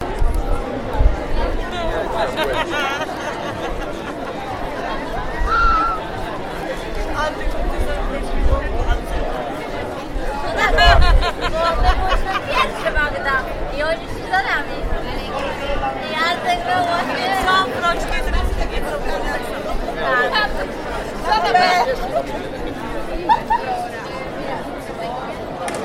Talking People

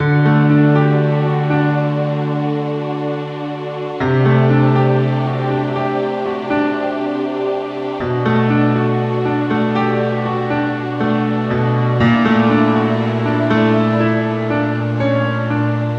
Sad Little Piano Tune

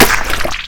Messy Splat

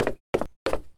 Walk Down Stairs

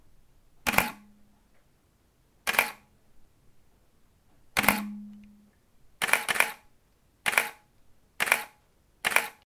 Canon 5d Mark 2 Shutter

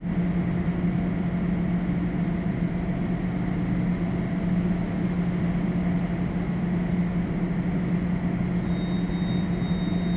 Parking Lot Ambience

